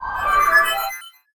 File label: Appear; Fi appearing and disappearing; zelda sounds; Skyward Sword